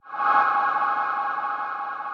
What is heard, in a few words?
ambience